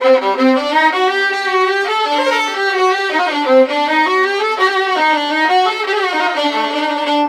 132, Balkanish Traditions; USB Soundscan vol.63; AKAI

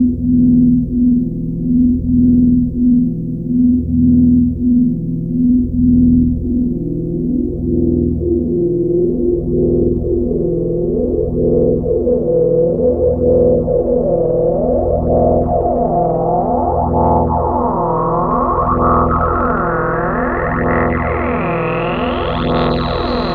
ATMO, Special FX Twisted; USB Soundscan; AKAI

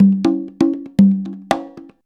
CONGA 119

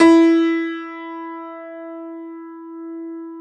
E3 YAMAHA, GRAND; NS GRAND

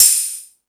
More Cymbals, Drum Machines E-MU Pro-Cussion

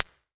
hihat; Big Fish Audio; Hardcore Breakbeat Action

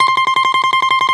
BALALAIKA, Russian Strings

